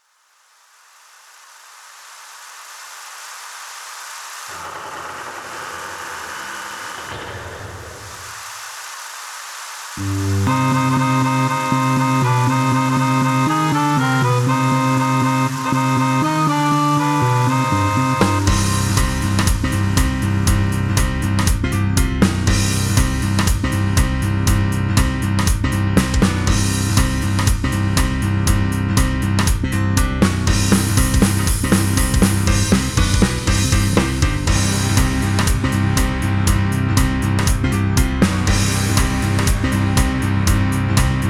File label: Halloween Party in old train Inst